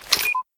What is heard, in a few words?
bear quickhide, detectors; STALKER; IWP